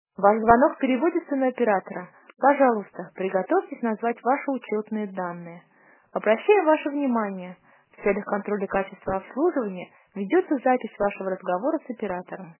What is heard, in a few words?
ivr sonic